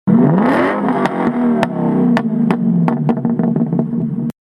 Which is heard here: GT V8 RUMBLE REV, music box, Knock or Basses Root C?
GT V8 RUMBLE REV